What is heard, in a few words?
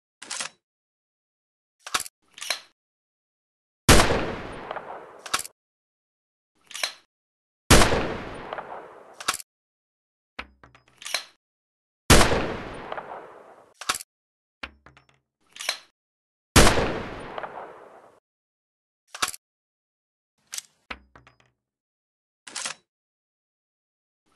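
Lee–Enfield, gun; لی انفیلد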